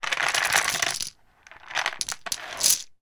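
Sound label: scrabble game board fold with letter tiles on and pour into pouch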